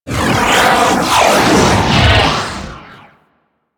Corpus Fighter Ship Attack Maneuver, Space Fighter Ship Engine; Vehicles; Warframe; Rail Jack; Rail Jack Enemy Ships